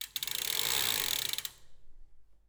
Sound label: Back Pedals, Bicycle